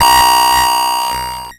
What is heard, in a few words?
Digital; Adobe; Multimedia Internet CD-Rom Flash